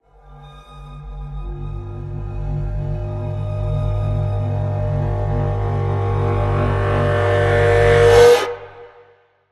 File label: Oddball Tension Cres